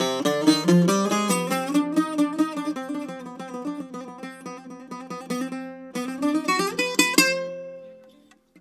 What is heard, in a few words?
BOUZ C-1, bouzou